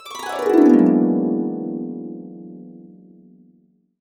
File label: E, Harps; USB Soundscan vol.62 - Complete Harps; AKAI